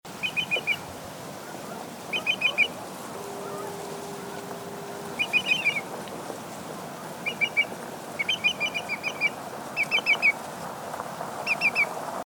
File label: greater yellowlegs call; chesapeakebay